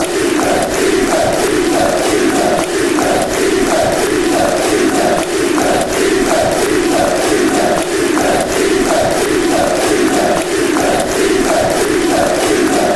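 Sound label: fanchants, football chants; swfc; Hark Now Hear the Wednesday Sing Chant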